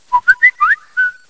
whistle

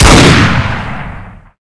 fire right

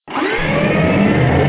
door move

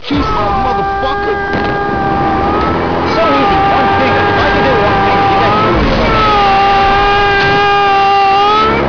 pit drone melee attack

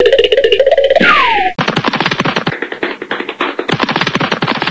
running

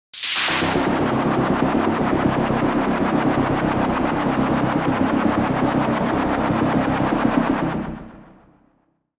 Alien Com; Resurgence

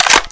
bolt up, weapons; hecate2

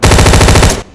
AR burst; epic; dahl; hyperion

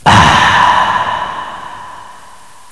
aah

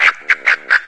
frog

